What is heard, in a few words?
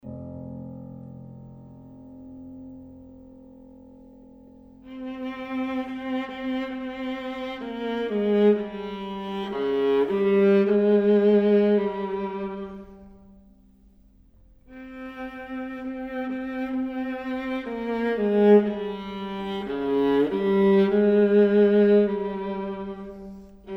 Sonata for Viola and Piano; 2 Mvmt